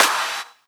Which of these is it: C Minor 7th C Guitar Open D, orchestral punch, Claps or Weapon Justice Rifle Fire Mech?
Claps